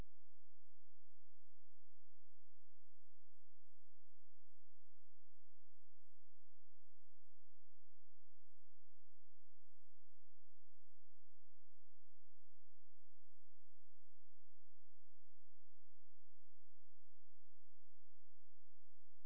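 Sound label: hydrophone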